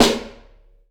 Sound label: Piccolo E, drum